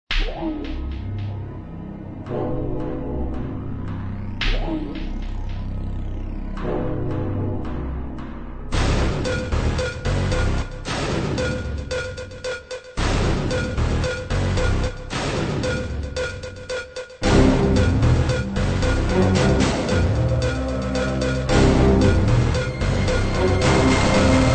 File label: Alarm